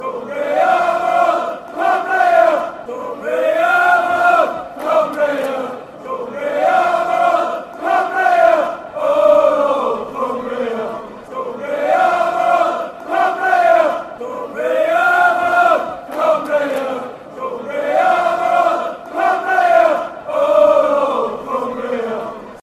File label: my lord, chants; cumbria